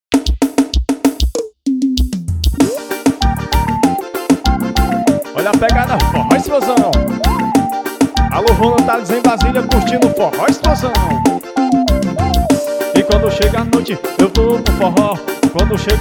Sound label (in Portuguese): forro